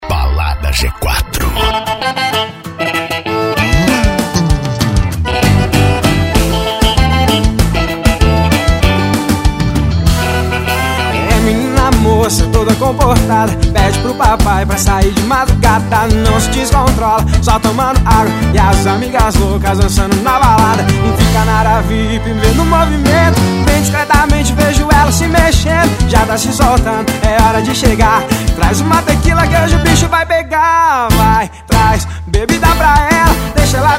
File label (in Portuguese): arrocha, sertanejo